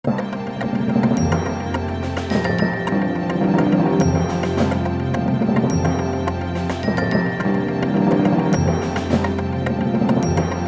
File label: Winner Drumroll